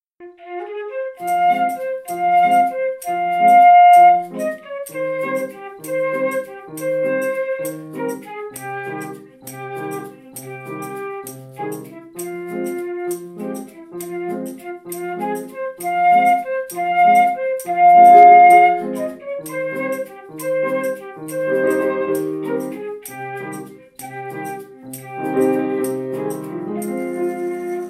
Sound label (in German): Flöte Caxixi Klavier